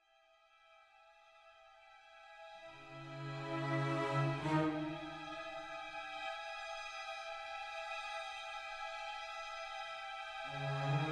Combat Lite Mood

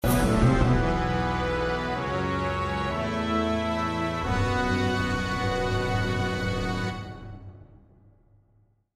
Level Complete; The Angry Video Game Nerd: Game Over 2; The Successor

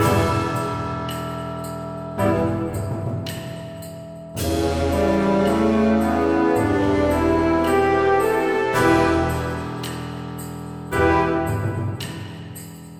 music boss polarbear

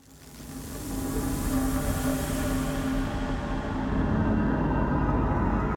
countdown 120bpm intro; Doom 2016

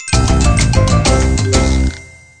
Level Clear

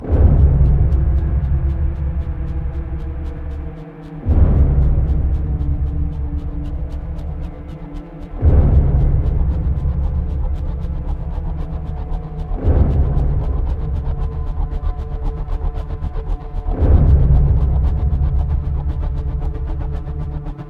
Dialogue Tension; The Dark Knight Rises; Android, iOS

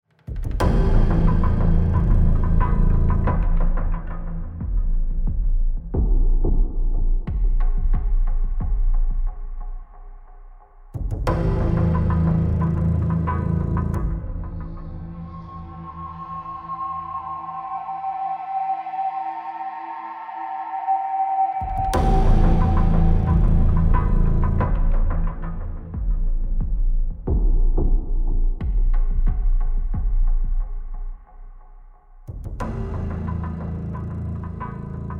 Exploration Night Rhythmic; horizon zero dawn

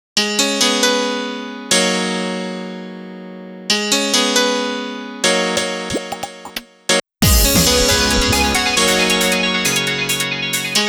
Mail Practice menu; Milestone Sound Collection

